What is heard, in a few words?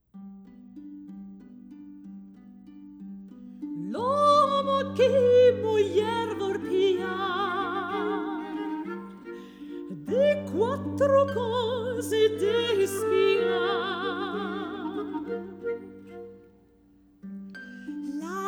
Folk Songs - La donna ideale (Italy); Luciano Berio; Deutsche Grammophon